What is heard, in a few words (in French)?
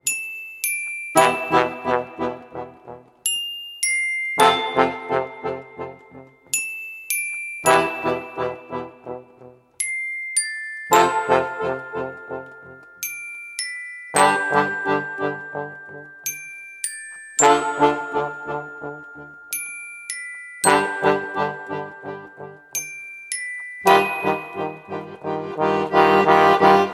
reveil Trombone; sonneries